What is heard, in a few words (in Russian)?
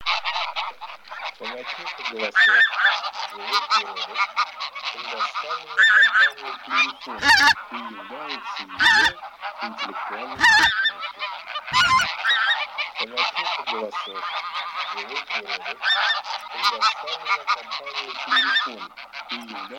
Гуменник Oca Granaiola Mix